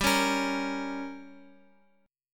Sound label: G Diminished, Guitar, Open F; strummed; x,x,x,2,4,5; JGuitar